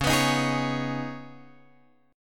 C sharp 7th Suspended 2nd C sharp down Guitar Drop D